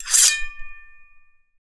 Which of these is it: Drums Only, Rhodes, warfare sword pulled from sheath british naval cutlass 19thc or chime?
warfare sword pulled from sheath british naval cutlass 19thc